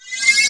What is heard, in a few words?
laser charge